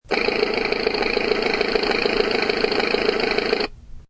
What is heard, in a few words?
Sound effect of small boat engine running.; Toy Boat Engine; Children; Human